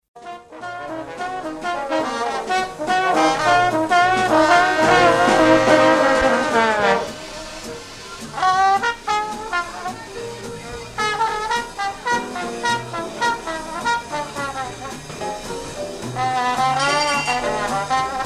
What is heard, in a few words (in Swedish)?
med septetten 4 tromboner